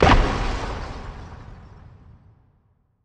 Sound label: asteroid damage interstellar huge; Factorio